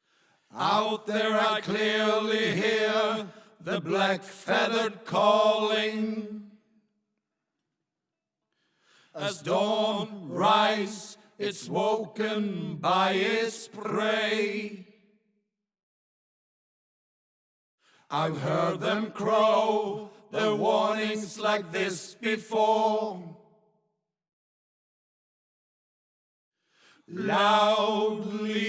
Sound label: eng chorus, Tempo 108.0